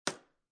Jar With Beads Place On Table, Foley, Jar, Small Plastic Jar, Filled With Beads, Placed On Wooden Table; Set Down